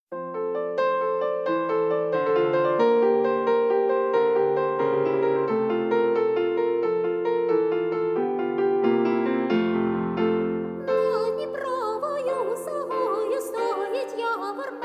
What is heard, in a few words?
instrumental